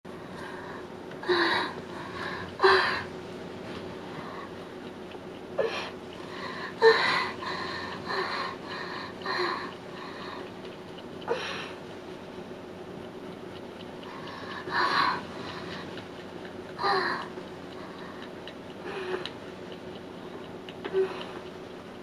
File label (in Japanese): えち音声